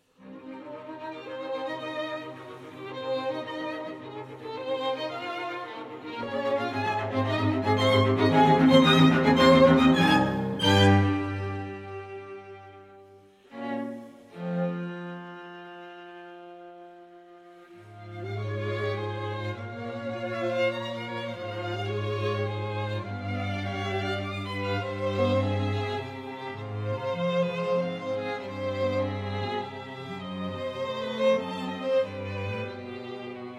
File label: String Quartet Allegro; No. 1 in C Minor Op. 51